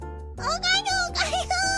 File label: excited; korone